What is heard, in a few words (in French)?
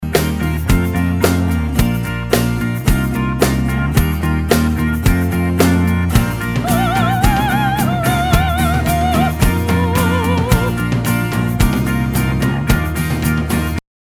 Lyrique, VOIX CHANTEES